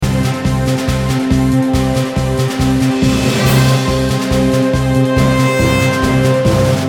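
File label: Short RPG, BGM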